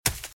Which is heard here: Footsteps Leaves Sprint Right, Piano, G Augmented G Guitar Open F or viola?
Footsteps Leaves Sprint Right